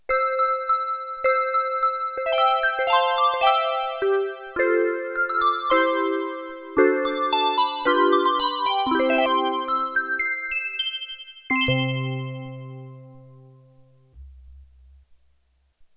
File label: Xmas Bells, Roland JX-8P